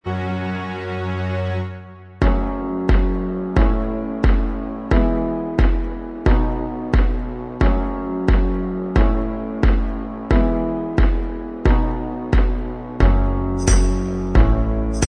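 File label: instrumental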